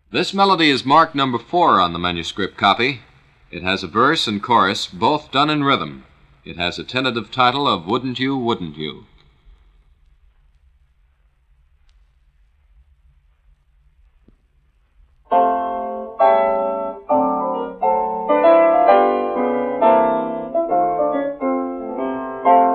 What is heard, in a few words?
Melody